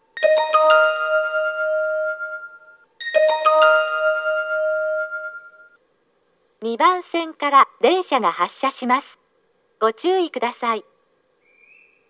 shin-toyohashi local mikawa-tahara, toyotetsu station